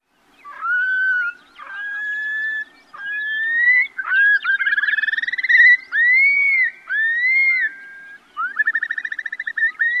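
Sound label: Long-billed Curlew, song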